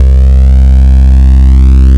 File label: Bass Note C; Best Service; House Definition VOL-1; Basses